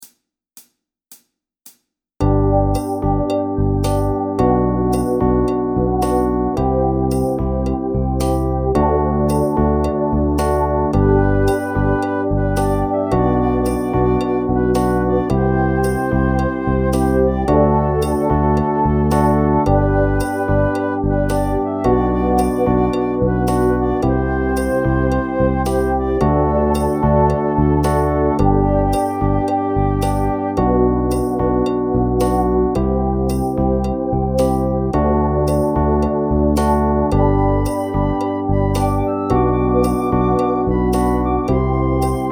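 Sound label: Drum Groove Fast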